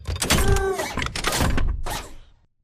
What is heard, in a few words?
alert idle to walk; Titanfall 2